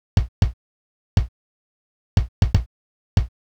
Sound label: 626 Kick; hydrogen